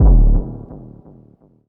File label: kick one shot distorted spacey